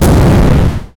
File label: ectoplasmic explosion lou; supernatural